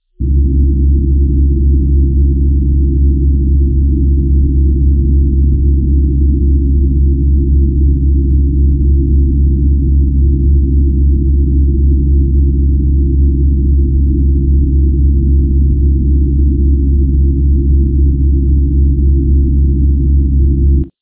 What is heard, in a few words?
Slowed Digital Artifact